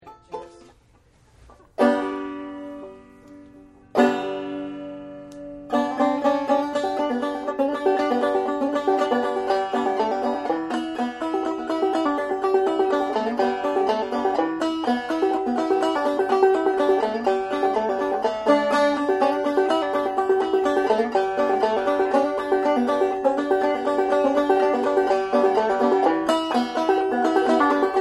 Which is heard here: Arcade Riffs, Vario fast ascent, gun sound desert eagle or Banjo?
Banjo